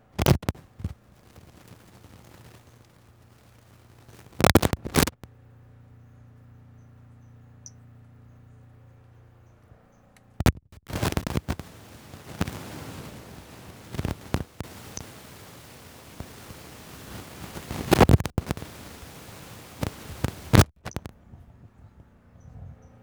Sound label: Brachycephalus albolineatus